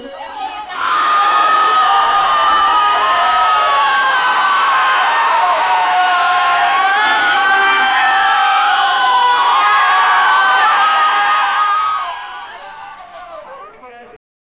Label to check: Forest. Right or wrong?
Wrong